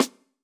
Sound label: hiphop snare, Effect on